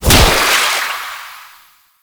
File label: Ink Death Health Orb A; Warframe; Follie